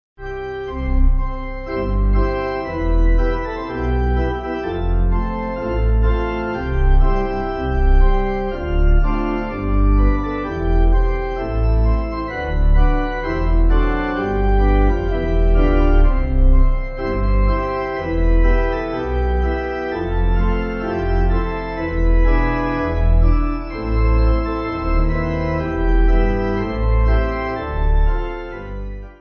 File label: Pipe